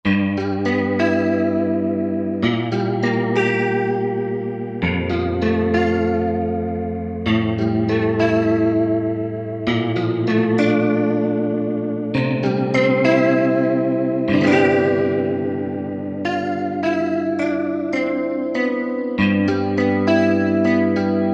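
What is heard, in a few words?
Rotary Guitar; Roland JV-2080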